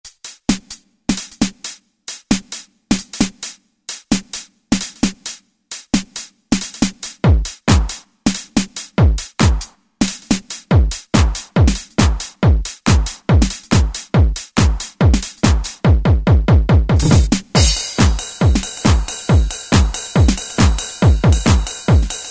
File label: Drum Set, Roland SR-JV80-06 Dance